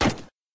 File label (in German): waffen schuss; minecraft